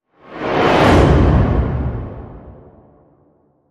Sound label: fly by passing